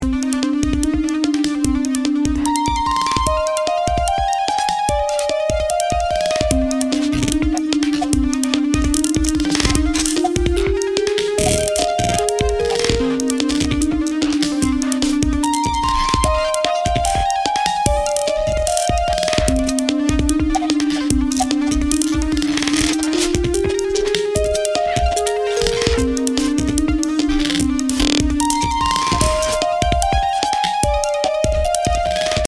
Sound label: Glitch; Doofers; Thebellows